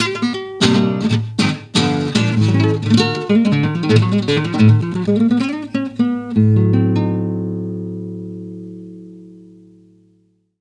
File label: Spanish Jingle; Captain Rainbow; wii